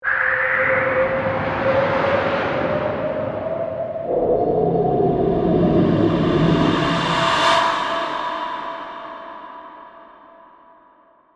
Ghostly Ethereal, Horror, Ghostly, Ethereal, Swirl; Ghosts (Sound Effects:Horror); BlastWave